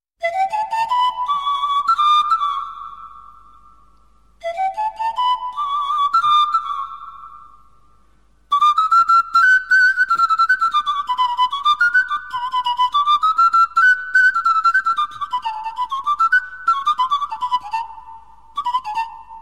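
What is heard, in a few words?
Romanian flute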